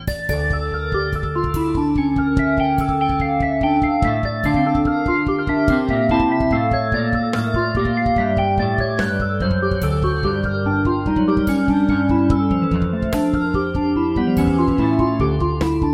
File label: neo b-3